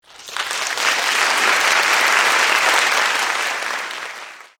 Clapping; android

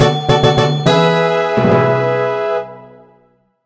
Bgm Build Complete

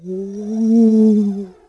Ghost

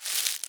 Panel Storage Plant finish; Among Us

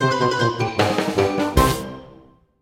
Room Complete; bad piggies 2; mobile

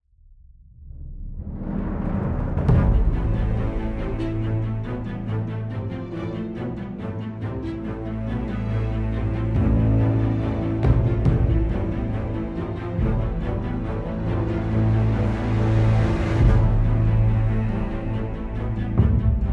Loop Suspense; Game of Thrones: A Telltale Games Series

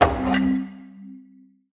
ui use fridge

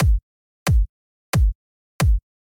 Kick kickloop; Sifu

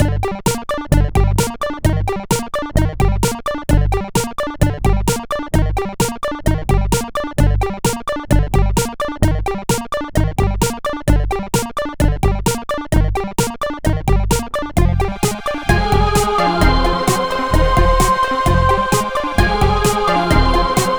Retro Beats Intro

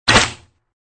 spike start

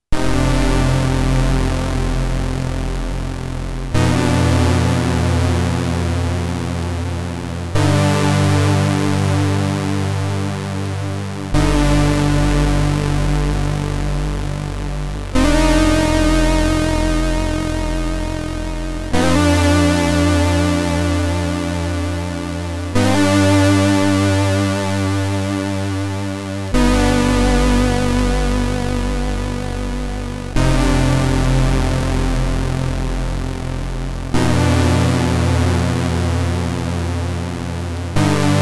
Game Over (SID Stereo); Wrath of the Demon; Commodore 64

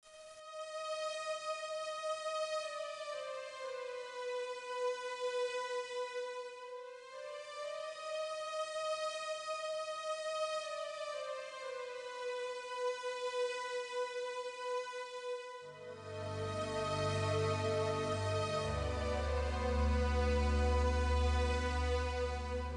Background Music

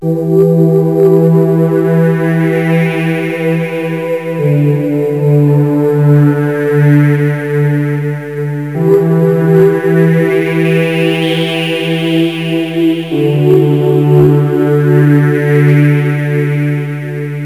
Quiz Loop; Visual Logic Training; NDS

